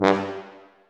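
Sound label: brass tuba C, staccato; Solo Brass; Roland LCDP12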